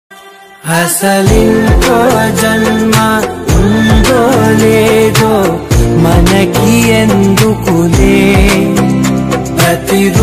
south indian love ringtone

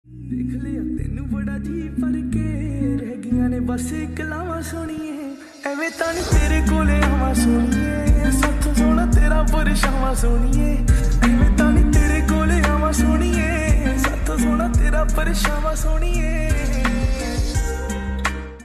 slowed punjabi love song

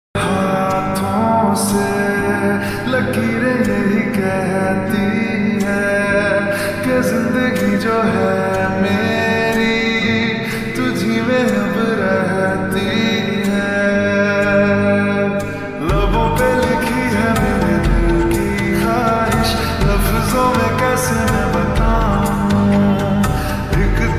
lofi

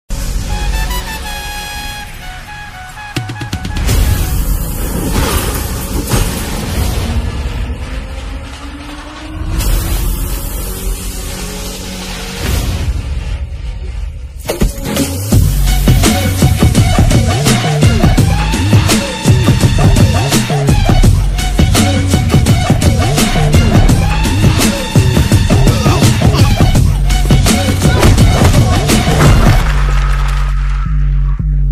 tamil bgm ringtone; coolie movie; thalaivar 107; rajinikanth

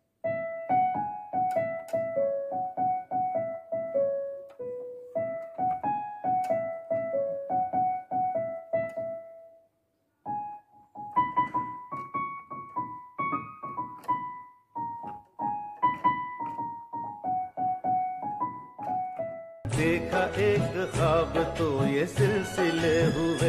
instrumental

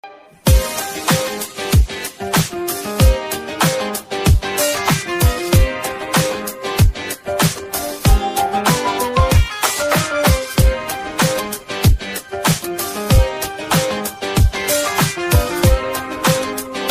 song ringtone, bgm ringtone; Dimri

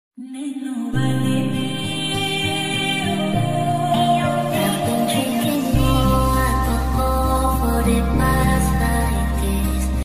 romantic ringtone

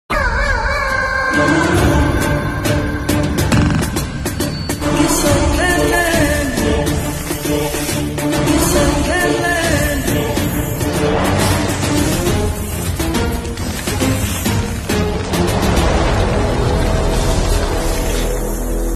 tamil ringtone bgm ringtone; chiyaan vikram; cobra

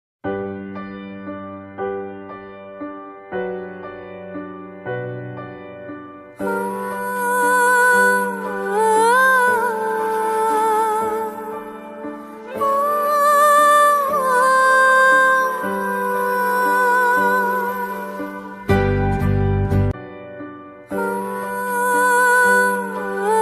song ringtone sad ringtone

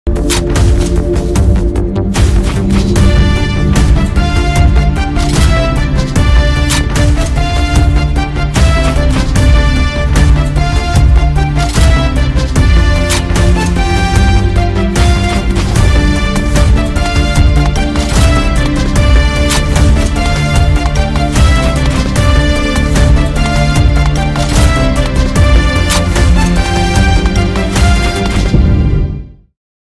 climax bgm cover; csk match; thalapathy vijay

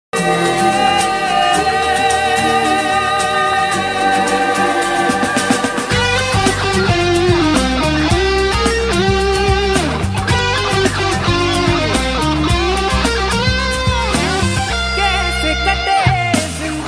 guitar solo cover guitar solo bollywood; the killer movie

